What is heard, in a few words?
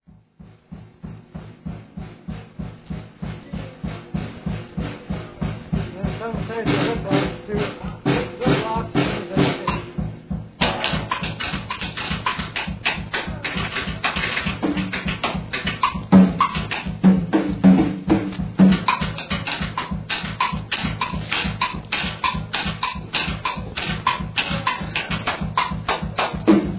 baby drum solo